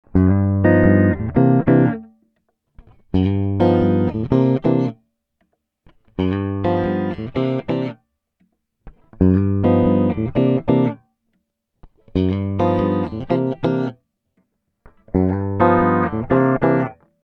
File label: bass lab guitar